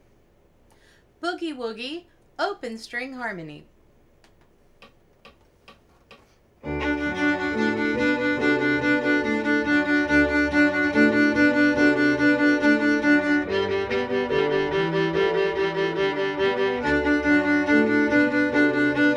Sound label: Boogie Woogie open string harmony